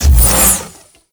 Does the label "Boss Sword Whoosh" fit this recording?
Yes